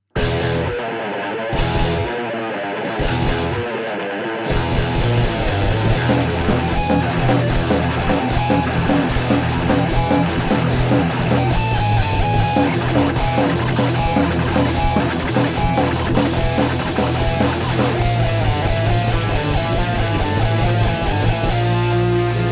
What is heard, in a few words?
raw no vox